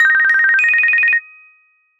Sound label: Triple Trill, ringtones; Samsung; Galaxy Watch 8